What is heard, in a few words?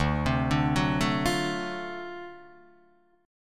D 7th Sharp 9th D down Guitar Open D